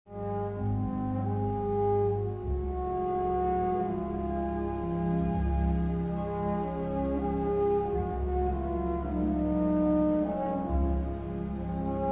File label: peaceful loop; Star Wars Jedi Knight II Jedi Outcast; XBOX